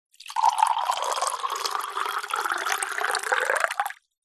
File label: drink wine or water pour into 125 wine glass; food